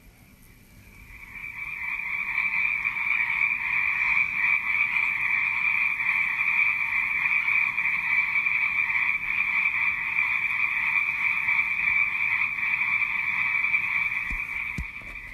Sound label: frogs